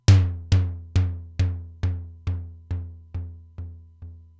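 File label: Simmons SDS-9 mid tom, Drum Machines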